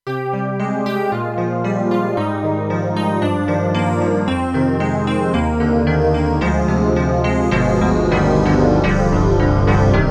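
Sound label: KORG TR-Rack Breathy Layers, piano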